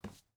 footstep burlap wood walk; Rust

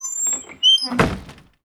Amb Constructions; Red Orchestra 41-45

